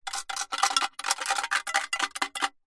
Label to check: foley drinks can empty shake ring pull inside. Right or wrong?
Right